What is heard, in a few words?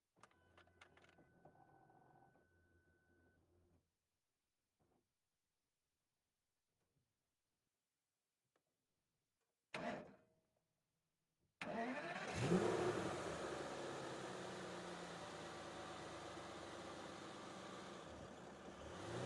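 aston martin db9 onboard medium start drive stop reverse stop drive horn stop off engine; mix; sonniss gdc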